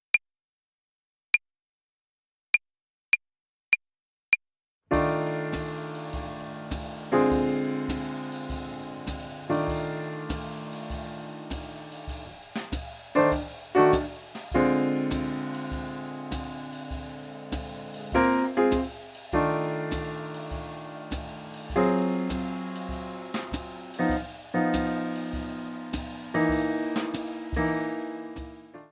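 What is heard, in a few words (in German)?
blues tonleiter übung play along slow; 2 4 und 6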